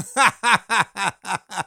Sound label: male laugh; CosmicRage